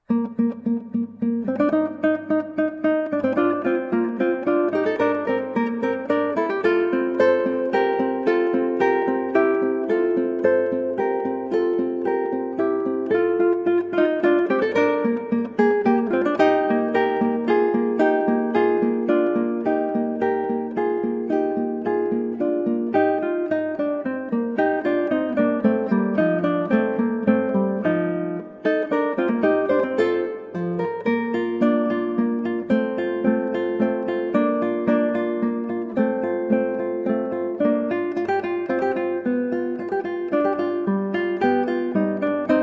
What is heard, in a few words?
Allegro, Guitar; Telemann; Recorder Duet in Bb TWV 43 107